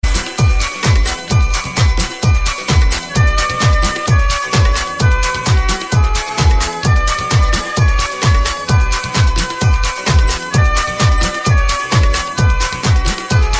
classic remix tune

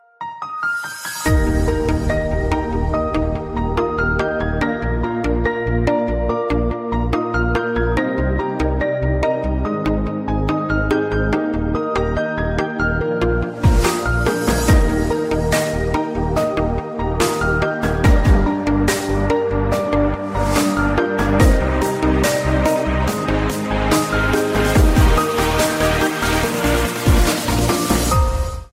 di di ding

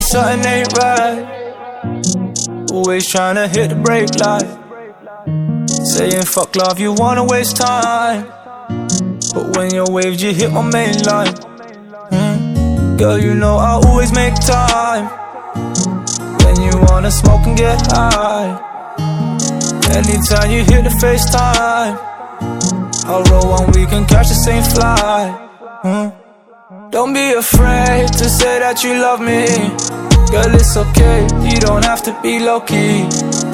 drill

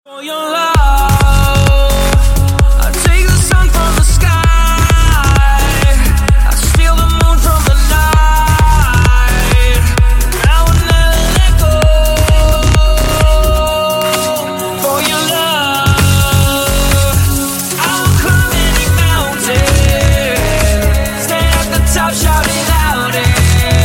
echo, ringtone

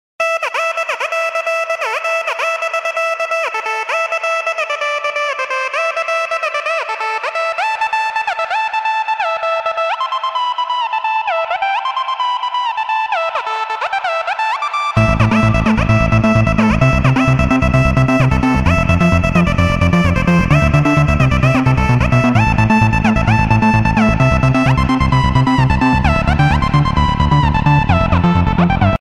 phone music ringtone

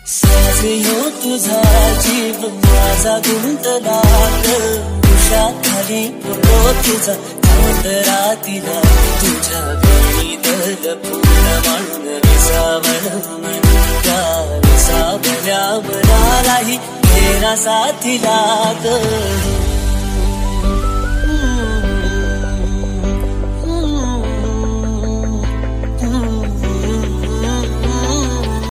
marathi

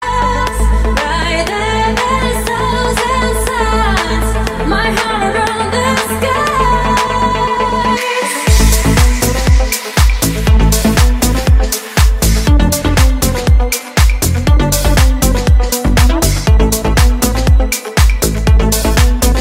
vibes iphone8

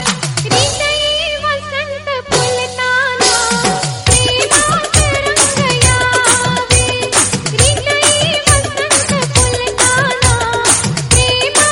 marathi love tune

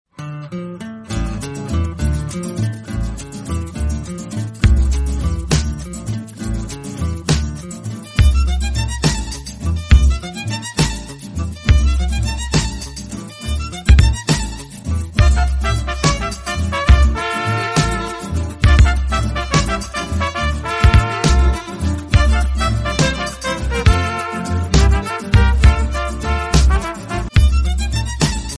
mexican tune

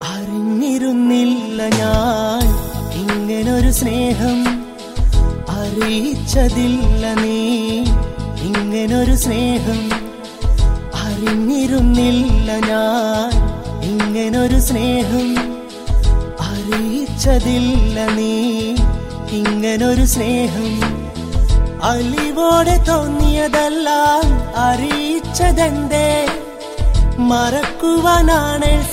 malayalam

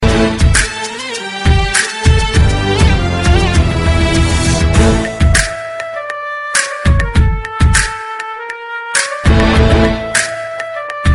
arabic trap

